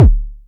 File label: kick, Korg ER-1; 300 Drum Machines; Kit 19